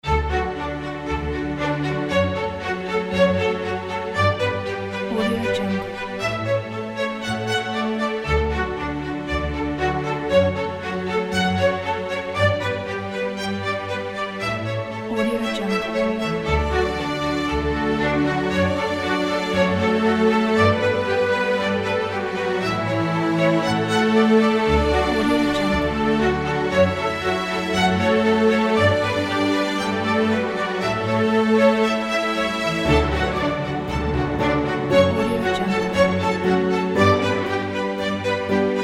classic kit

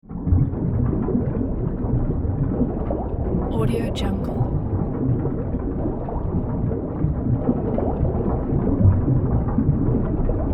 underwater ambience

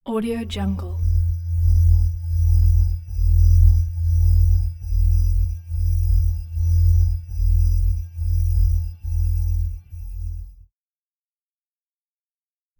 scanning a large alien machine; shining wolf

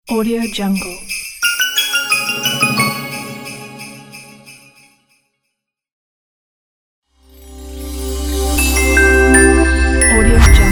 happy christmas logo pack

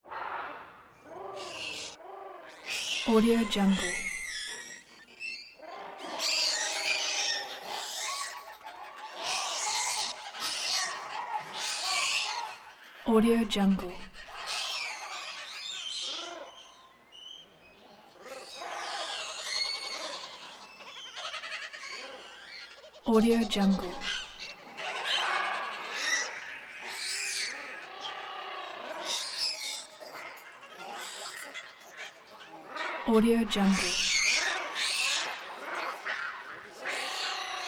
walla monkeys and birds in jungle

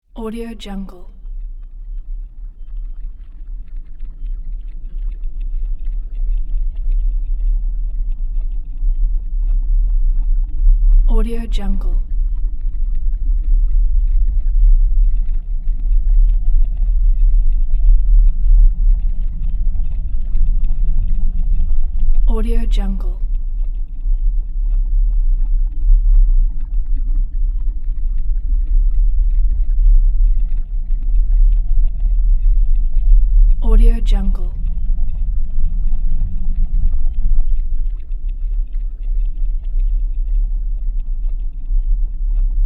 low rumble

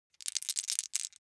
foley dice small three rattle in hand short; smartsoundfx

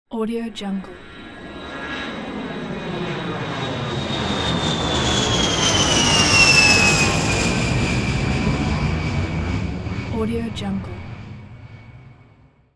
jet pass by

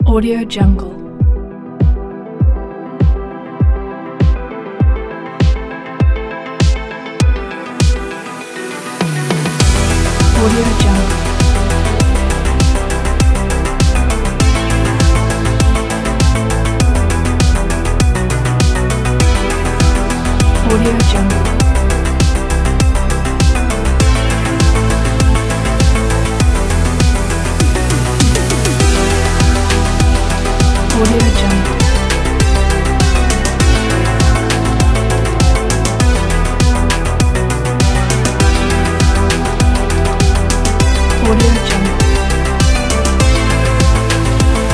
dark retrowave

